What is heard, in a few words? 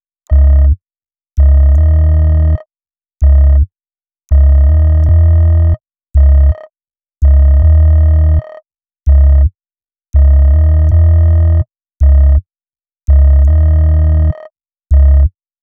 Bass, Hip-Hop